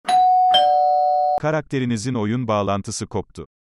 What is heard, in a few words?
DC Alarm